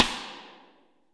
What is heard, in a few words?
rim; Best Service; Real Mega Drums VOL-1